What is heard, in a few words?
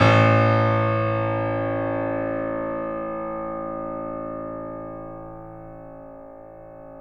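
piano, left; InVision Interactive; The Piano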